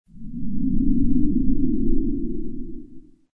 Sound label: hallway wind; Dystopia